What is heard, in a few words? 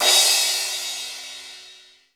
MPC1 CRASH, drum; Crypton - DrumBase5000; MPC DRUMS